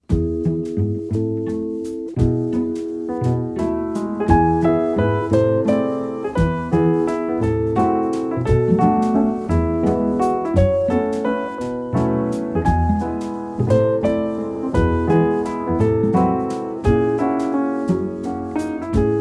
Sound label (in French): Valse pop